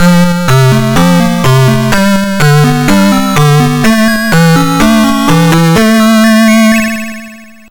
Return in Triumph (Stage Clear), atari st; r-type gamerip 1988

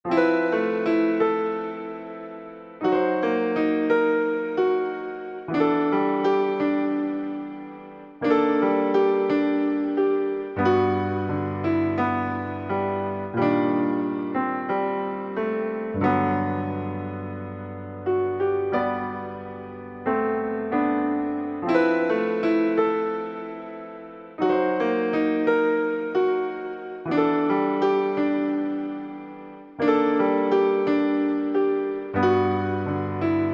a young lady (Piano); Rikari'n; Memories Off Sorekara

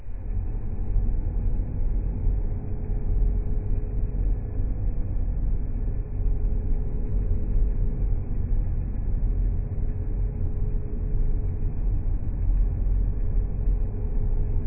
Computer Room; ZeroRanger

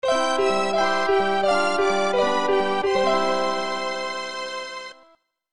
Game Over; SimCity 2000; SNES

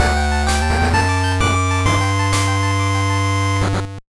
Victory Fanfare Chiptune Version; one piece going baseball kaizoku yakyuu

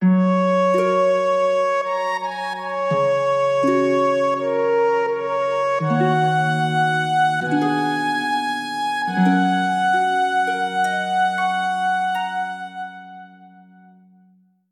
Jingle Event; Taiko no Tatsujin V Version; PS Vita

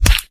Bullet Body Impact

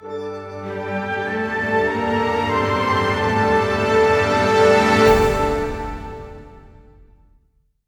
Player Lose; Rise of Nations: Rise of Legends; Doge

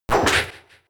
Triple Kick

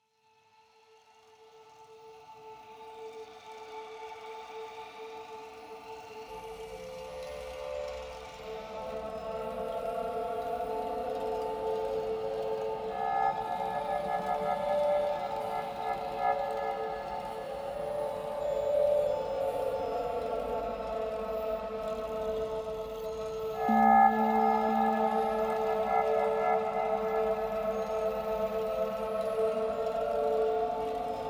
World Ambience

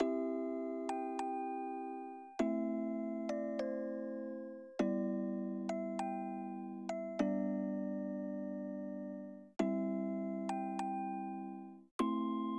Song Chime; Metropolismania 2001 PlayStation 2 gamerip

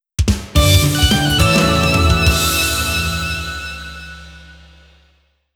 [JINGLE] Unlock; sonic and the fallen star

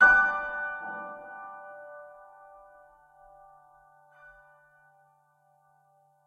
Piano

